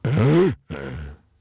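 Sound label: male angry; People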